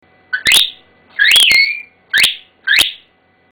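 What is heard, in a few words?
Get out, whistle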